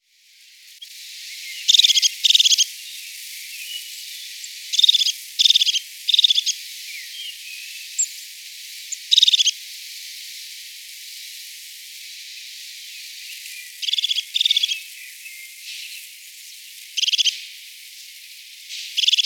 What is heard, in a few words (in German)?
Gesang der Haubenmeise